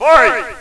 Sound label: fight; blockofighter